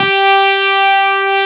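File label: Wurlitzer G3 - R; USB Soundscan vol.09; AKAI; Keyboards Old School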